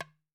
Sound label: ROSEWOOD, African Drums